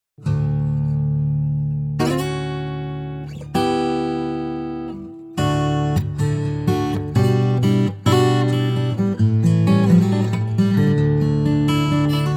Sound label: Guitar